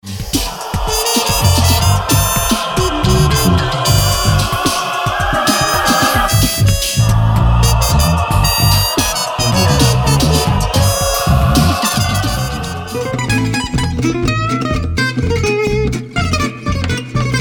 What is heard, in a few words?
Jazz